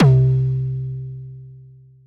C Synth, One Shots

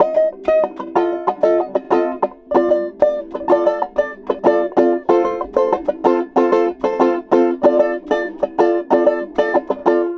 Banjo; Rondo Brothers; Sing Into The Machine; Sonic Foundry (Sony Creative Software)

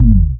KICK PRO -R, Disco House; USB Soundscan vol.20 - Fresh Disco House I

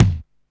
Kick, Alesis Hr16, Drums

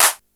clap, Yamaha RY-8

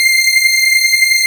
PAD WARM, Nord; Wizoo - Powered Nord; LEAD PAD HRN

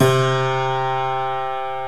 TACK PIANO

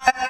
soft vox; AKAI; FX SIGNALS

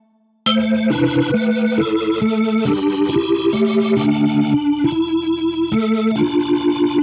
synth loop, drum n bass

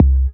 DM BAS, Dance Mega Synth; Best Service

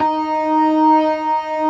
TARK, organ combos

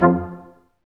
BRS Quintet stac; Roland L-CD702

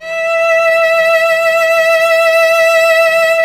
Cello E; Miroslav Vitous; Solo Instruments; Cello 1

